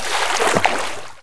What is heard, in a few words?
player swim